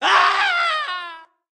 Halloween Scream

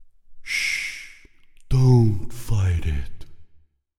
Activated Big Squeeze

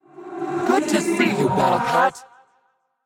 Place Battle Cat; Dark She Ra

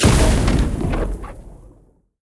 Explosion Magic

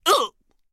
Damage; Quincy

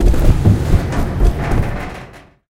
Removable Workshop Chute Boxes; BTD6

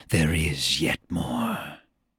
Upgrade; Corvus

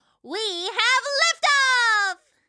victory rocket spin; Scientist Gwendolin (Battles 2)

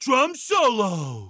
drum solo; pat taunt

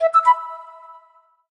alert

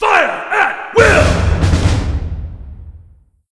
activated artillery command

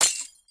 Pop Glass

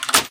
UI Chest Latch

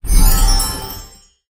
Tower Level Up

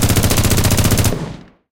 Gatling Auto

